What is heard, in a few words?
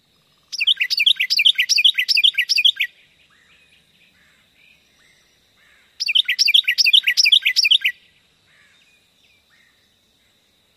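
Carolina Wren song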